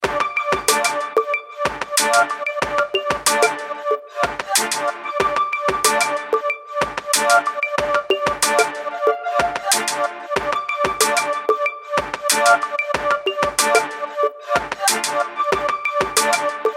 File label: sweet musical ringtone